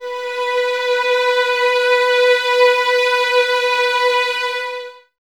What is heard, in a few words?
B 4, String Ensembles Violins; Miroslav Vitous; 11 Violins